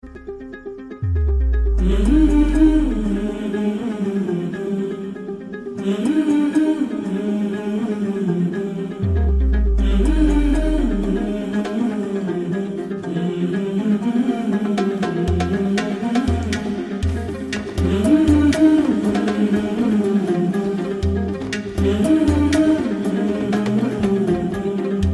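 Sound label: background music ringtone; CHARLIE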